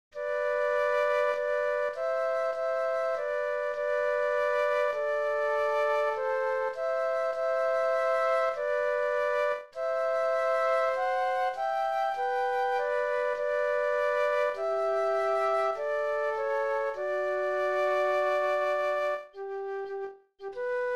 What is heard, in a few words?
Flute Duet